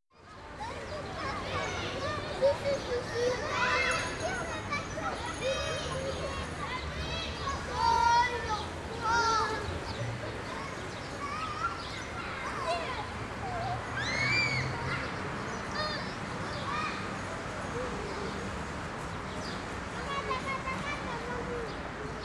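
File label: children's playground, kindergarten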